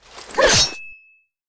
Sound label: Bass attack; MUDevs S20.1-3